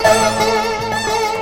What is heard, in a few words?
dobro danger